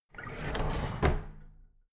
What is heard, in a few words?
drawer big squeak close regular